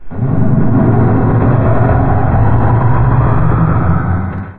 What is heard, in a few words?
teleport mechanism windup; Garry's Mod; hl2; labs